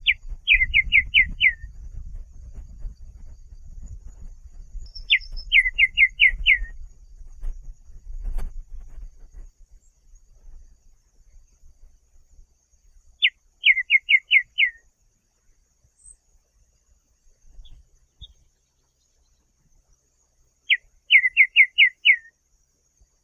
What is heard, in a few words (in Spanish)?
anambe negro; Pachyramphus polychopterus